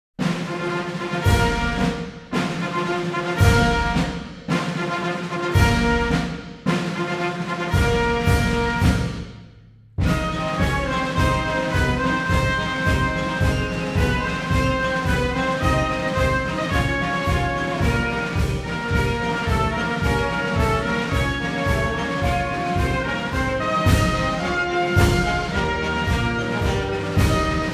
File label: four ruffles and flourishes into honors march; Marine Corps Birthday Ball; March, "The Stars and Stripes Forever" (John Philip Sousa)